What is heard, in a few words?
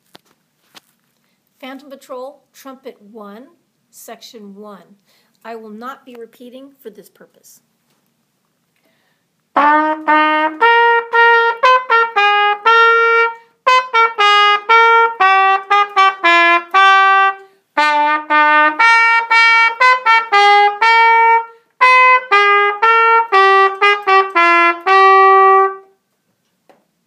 Trumpet, Cornet